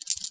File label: coins; anito